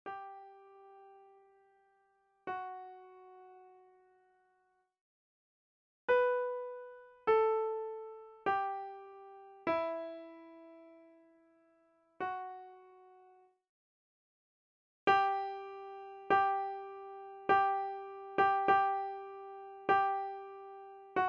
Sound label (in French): Jesu Salvator mundi Voix; Menegali